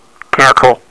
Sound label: CANCEL; Gold Medal Software Volume 1; music; digi wave